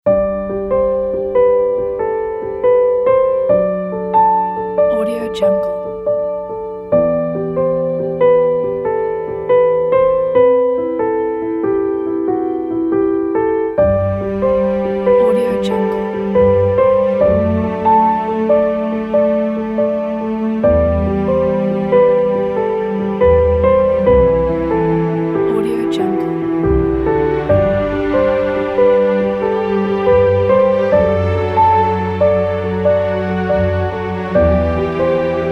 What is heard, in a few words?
wedding day